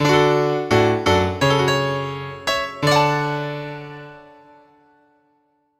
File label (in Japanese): ピアノ単体ジングル